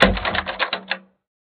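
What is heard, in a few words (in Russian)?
глухой низкий звук закрывания дверей на замок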